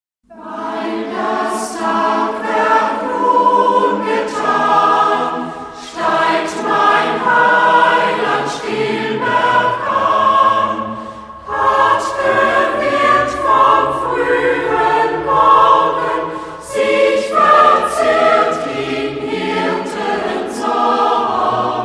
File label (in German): Gemischter Chor; Gemischter Chor Derschlag